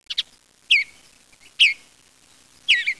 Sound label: bluebird